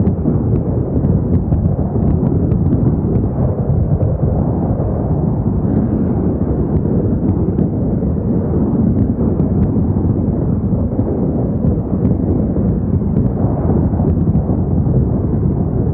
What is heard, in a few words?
Fire Shot Low Loop; Warframe; Lizzie